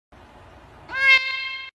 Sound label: Cat screams and disappear